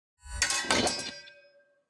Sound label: ui battle begin; Shelter